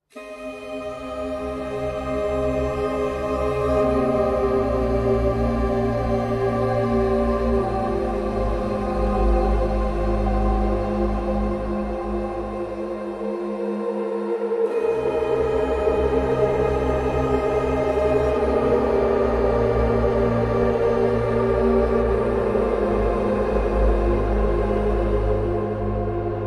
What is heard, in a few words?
Sirens; Odyssey